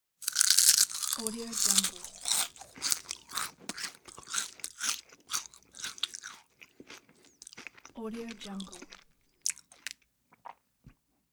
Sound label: eating chip